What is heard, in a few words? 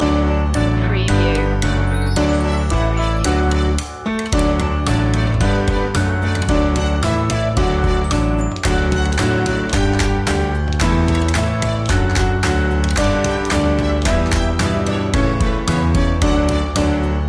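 bingo instrumental loop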